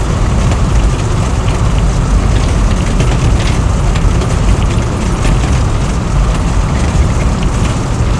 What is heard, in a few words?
grass dry, Vehicle; NASCAR Thunder 2004